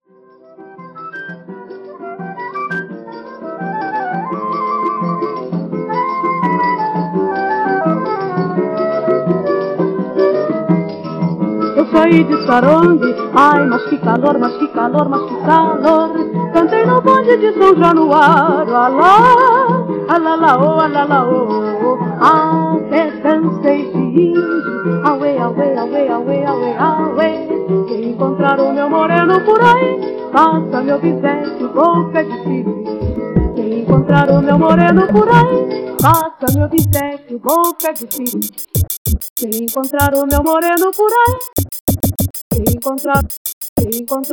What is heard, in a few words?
instrumental